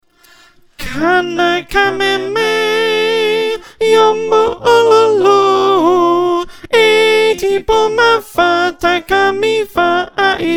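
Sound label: soprano, choir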